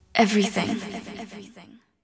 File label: psychic scream